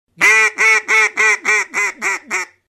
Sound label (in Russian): zvuki utki